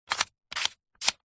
leisure game plastic panel squares slide solving puzzle; rubiks race